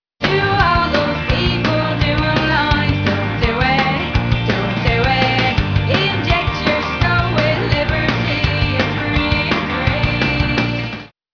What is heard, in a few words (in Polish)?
dzwiek brak aliasingu